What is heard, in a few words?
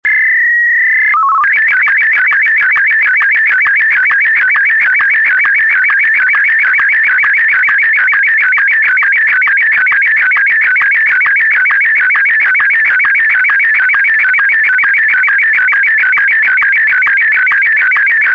sstv martin m1